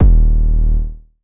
bass 808 punch F; Polyend; ARC Noise

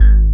SQWEEKY BASS; E-MU Formula 4000 Series Vol. 5; Protozoa; Phatt Instrmt

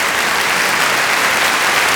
BIG CLAP; AKAI S6000 CD-ROM - Ultimate

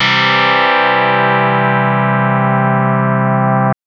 Synth FM 2 Op Basic 3 maj c2

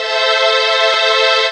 DR. LOOP; X-Static Goldmine 3; CHORDS

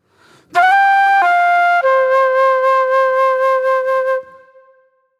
Acid Jazz Flute; Sonic Foundry (Sony Creative Software); Manhattan Flutes; Smooth Groove

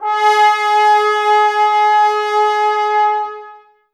Brass, Woodwind Brass Ensemble

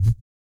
WOOF DOWN, Vocal Blues Soul; Spectrasonics; Planet

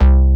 SNGL.BASS, Jungle Rave

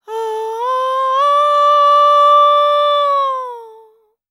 World Voices, INDIA; ILIO; Vocal Planet

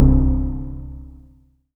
C2, Synth Line

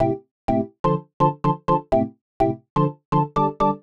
Organ chops (125BPM), retro house

